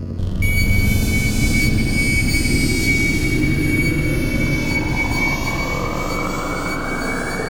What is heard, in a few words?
Long Decay, FX; Sonic Foundry (Sony Creative Software); Underground UK House & Electro